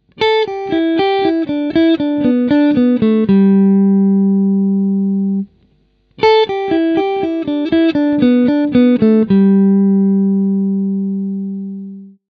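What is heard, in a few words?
Major Pentatonic lick, guitar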